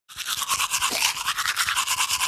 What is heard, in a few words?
Toothbrush cleaning teeth brushing teeth short